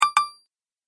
Menu Notify Double Ding; Ping Sound Effects

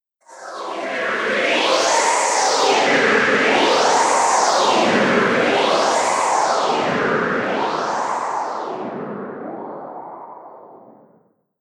Ghost Whoosh; Ghost Sound Effects; horror

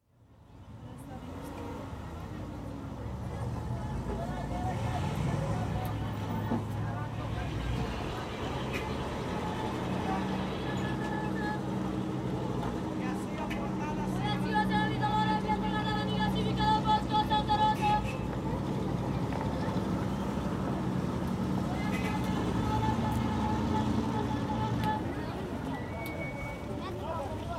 Buses Horns; BBC

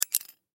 Carabineer Set Down; Set Downs; Foley

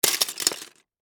Legos Drop Handful, Into, Box, Plastic, Toys; Foley; Drop Sound Effects; BlastWave

